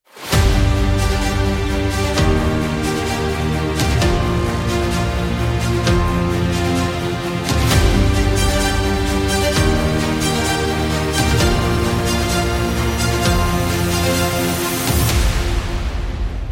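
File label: Epic Empire, epic cinematic trailer music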